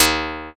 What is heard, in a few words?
slap bass